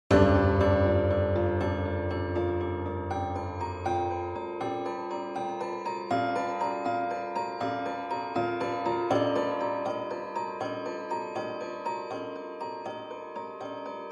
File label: Bells Only